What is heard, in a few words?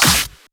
clap snare; Electro; drum hits; Anthemic